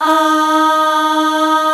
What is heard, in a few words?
POP AHHS